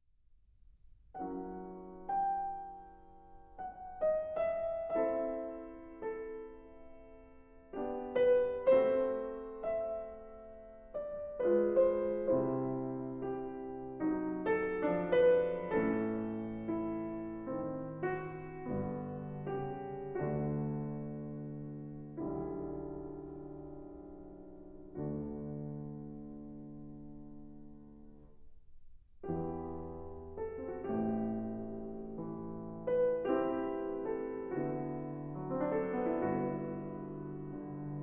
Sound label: Piano Sonata No. 2 in B Flat Minor II. Non Allegro. Lento